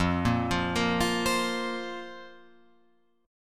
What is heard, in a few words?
F Suspended 4th F down Guitar Open F; Fsus4 arpeggiated; JGuitar